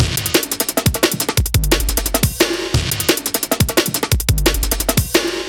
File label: 175, classic dnb breaks